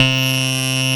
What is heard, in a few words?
SYN, SYN Analog; Sound & Vision - Gigapack I CD 2 (Roland)